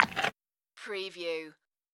dog creature chew

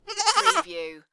Goat - Baby Goat Bleat

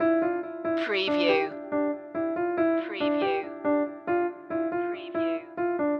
mind matrix loop

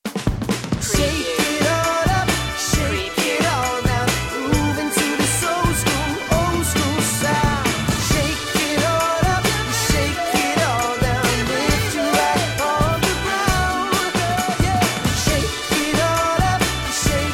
vocal

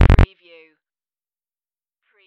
error

